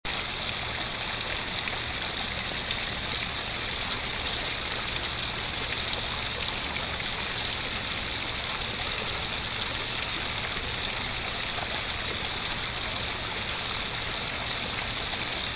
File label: waterfall